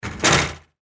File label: door snap